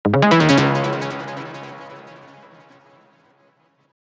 synth flourish 122 Cm

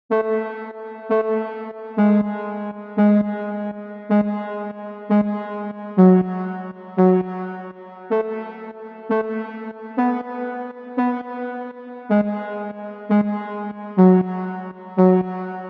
Serum Lead

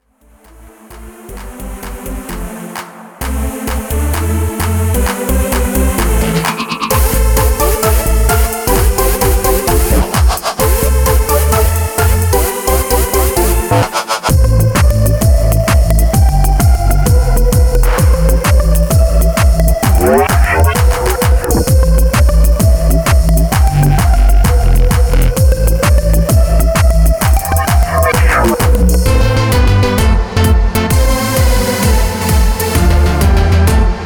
elemental edm

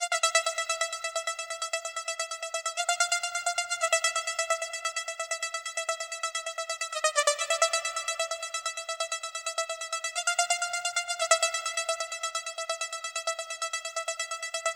Serum LD Top

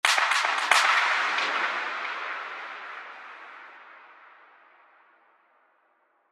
Clap Fill

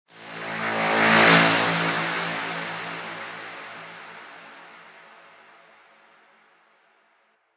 Tonal One Shot Am

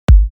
Kick 51Hz G; Steps

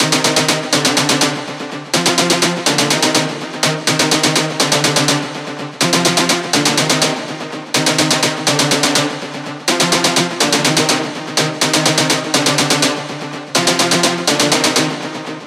Serum Lead

